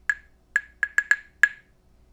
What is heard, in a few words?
claves; apogee